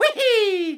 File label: Yoshi Jump; Mario